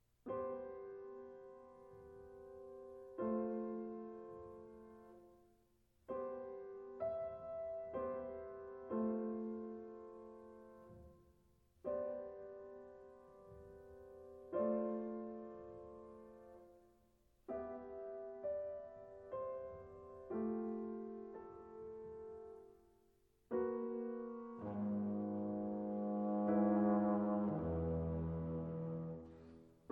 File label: Sonata for Trombone and Piano, Adagio; Music for the Soloists of the ABQ